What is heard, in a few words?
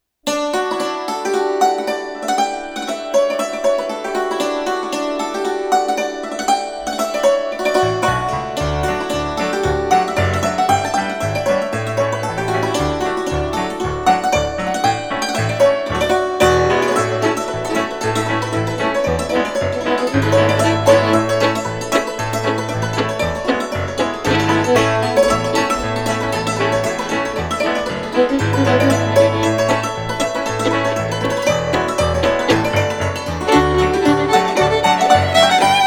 Dulcimer Reel